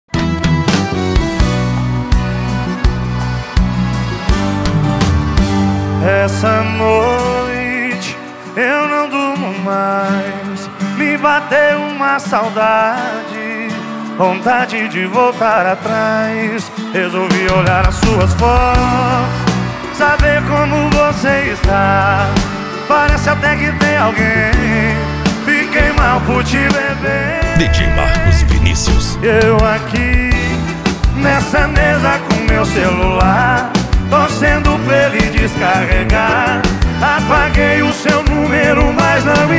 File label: sertanejo